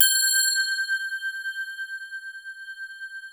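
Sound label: glass tubes; Sound Gallery - Charged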